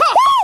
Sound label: ha ha, ooh ahh; Voice